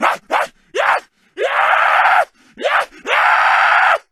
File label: zombie rage